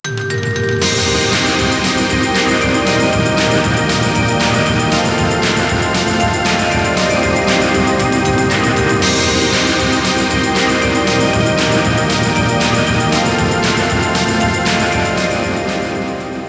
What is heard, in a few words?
Str G#